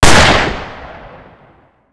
Magnum 300 Fire; Weapons

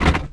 bouncy office chair; bouncy objects; Tony Hawk's Project 8; XBOX

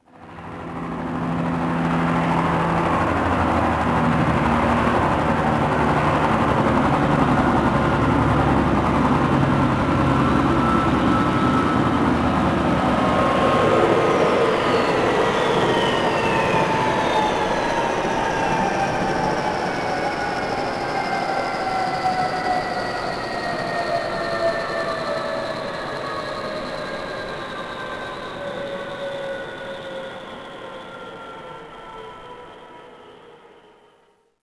helicopter landing outside; chopper; Max Payne

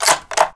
Bolt Rifle Close; Weapons

